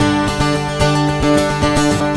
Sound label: unstrung, drums; BRADLEY FISH; Sonic Foundry (Sony Creative Software); Vital Drums